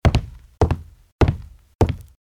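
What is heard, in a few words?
wood floor footsteps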